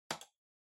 PC-Mouse (Far-R-Hard)

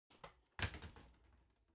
Electric Fan Far Knock Over

